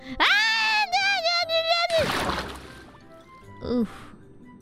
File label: falling scream; IRyScream